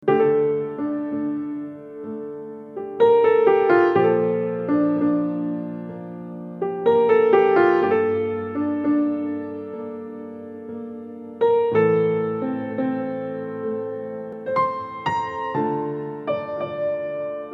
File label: soothing mobile ringtone